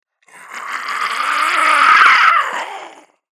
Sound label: Zombie Attack Aggressive; زامبی